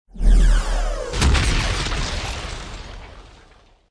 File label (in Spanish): impacto misil